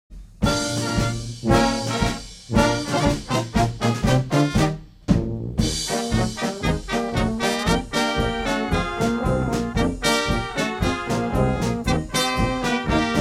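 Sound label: Polka, Brass Band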